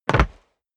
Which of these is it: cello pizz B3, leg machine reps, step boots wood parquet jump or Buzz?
step boots wood parquet jump